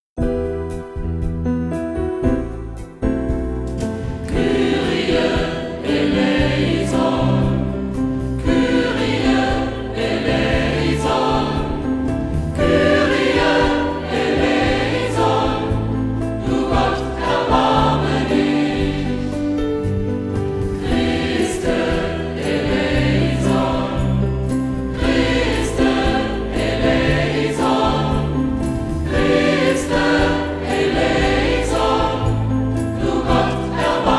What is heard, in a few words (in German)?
Kyrie eleison Chor